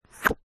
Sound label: animals lizard tongue eat insect